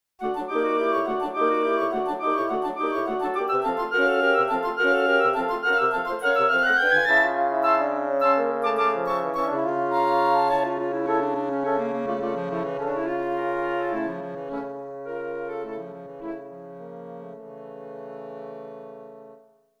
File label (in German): tutti